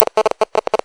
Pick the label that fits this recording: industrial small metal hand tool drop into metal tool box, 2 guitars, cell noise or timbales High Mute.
cell noise